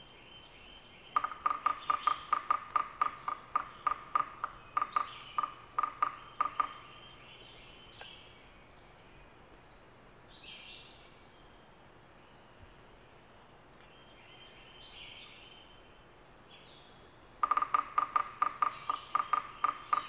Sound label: Yellow-bellied Sapsucker, Sphyrapicus varius; woodpeckers